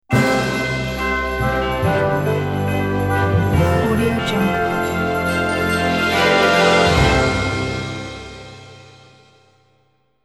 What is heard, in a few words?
christmas time logo